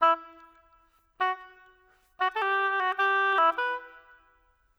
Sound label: Oboe, Orchestral; Sonic Foundry (Sony Creative Software); Orchestral VOL-2; Modern 02